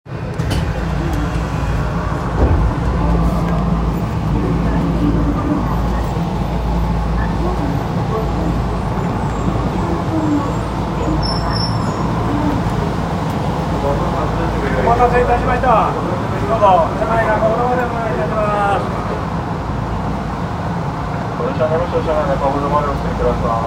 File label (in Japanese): 銀座線新橋駅西, train sounds